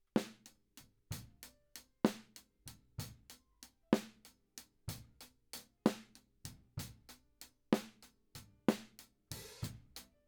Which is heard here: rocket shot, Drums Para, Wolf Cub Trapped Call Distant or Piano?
Drums Para